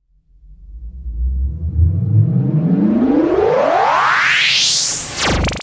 BREAK OUT, Trance; ILIO; Trance Fusion; STOP LONG

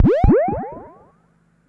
Perc Synth Moog Delay

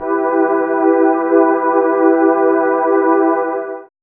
SYN SMALL, dance synth; Sound & Vision - Gigapack I CD 2 (Roland)

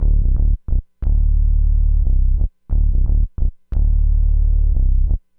bas D, Hip Hop & RnB

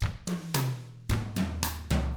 COUNTRY, Drums; ILIO; Double Platinum Drums 1

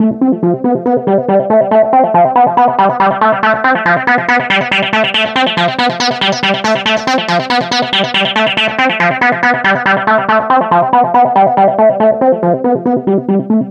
NRG Sweep, Trance

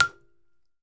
BALAFON, African Melodic; E-MU Producer Series Vol. 7 – Old World Instruments

